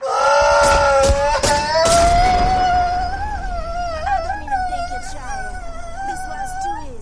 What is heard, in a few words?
cry